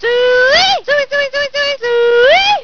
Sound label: pig call